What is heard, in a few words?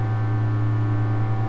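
generator room stereo loop, ambience; ambient; Left 4 Dead 2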